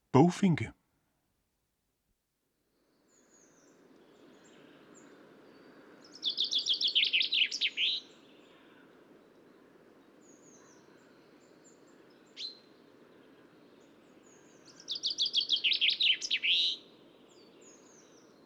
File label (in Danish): Bogfinke; assistens